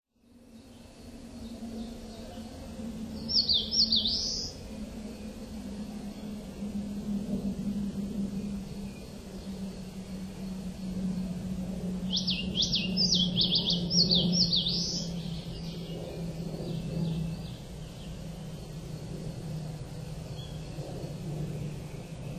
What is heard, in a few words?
crowned warbler